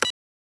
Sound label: Click Lock Beep; FREE SFX PACK VOL 2